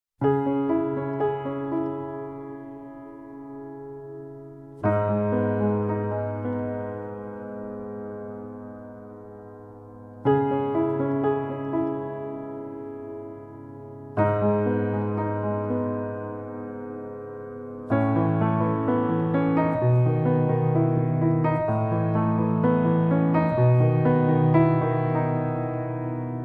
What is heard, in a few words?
clasic piano